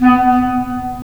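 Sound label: wind clarinet; LA Composer vol. 3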